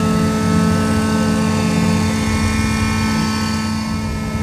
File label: turret; World of Tanks